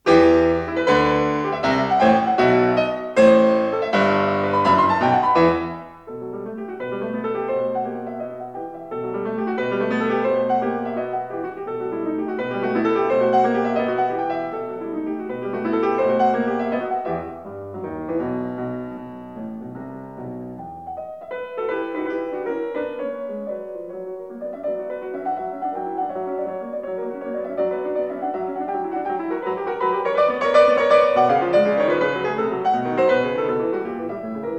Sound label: Piano Sonata No. 30 in E major; Wilhelm Kempff; Beethoven; Piano Sonatas